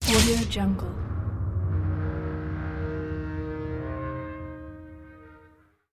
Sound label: searing metallic impact zappy laser blast; برخورد لیزر به فلز; industrial sounds